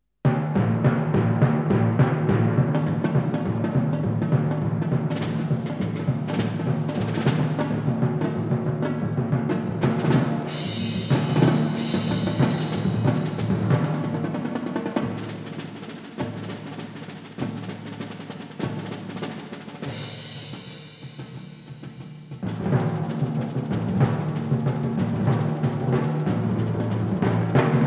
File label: Folk Scene